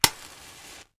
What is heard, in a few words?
striking a match